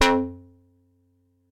Twenty Perc Dixo, Percussive

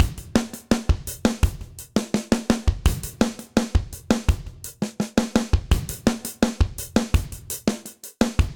Bright Room 84bpm, Session Drums; Raincoat; Ableton; Factory Packs; Session Drums Studio

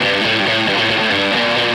137 Garage Guitar Am; Dance DJ

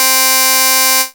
Super Saw; Ableton Foundation FreePacks

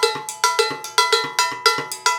110 HipHop Timbales; Percussion

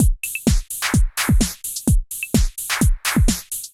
Kit groove 128 bpm, Drum; MIDI Clips; 111 - 130 bpm; 106 Typhoon Kit groove; Ableton; Factory Packs; Drum Essentials

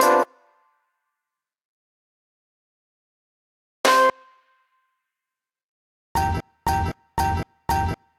Loose Foot Hits, Breakbeats; Ableton; Breakbeats by KutMasta Kurt

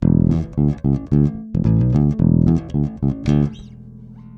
110 Old School Bass E, E-Bass; Pop Rock

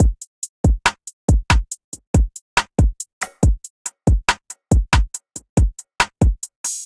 MPE Pinnacle Kit 70 bpm, Trap Drums; Trap Drums by Sound Oracle; Ableton; Factory Packs

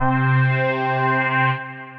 Pad, synth pad; Tropos Pad; Ableton; Singularities

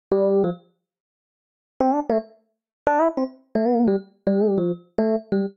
Piano D Minor 86 bpm; MIDI Clips; Tonal; Melodic; Dan Piano; Ableton; Factory Packs; Chop and Swing

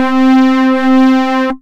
Poly Berg Keys, Operator Synth Keys; Instruments; Ableton; Synth Essentials

